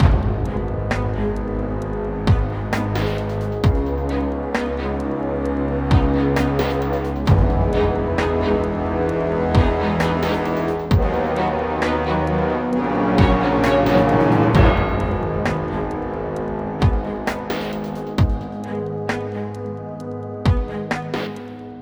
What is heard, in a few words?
Cinematic Drama; Epic Dumpster Bear; Wii U